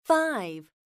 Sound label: Key Tone